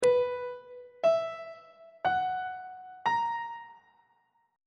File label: b major 7th suspended 4th chord intervals on treble clef